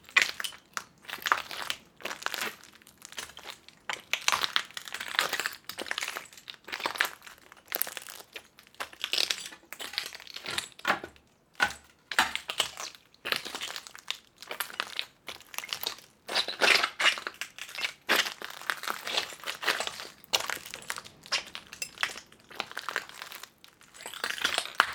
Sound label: Stepping On Shards